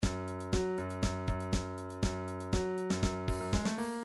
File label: drums bridge, midi